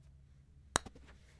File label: Golf Putt